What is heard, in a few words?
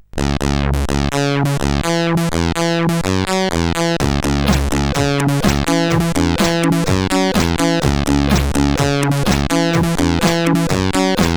Short Tunes, sid CSG8580